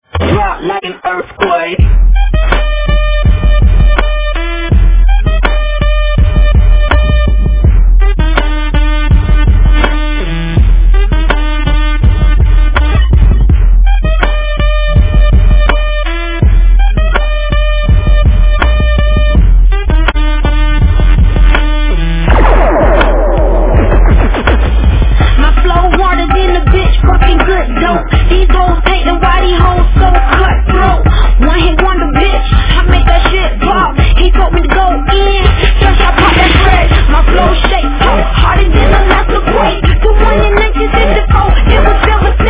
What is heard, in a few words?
Earthquake ring